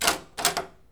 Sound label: door open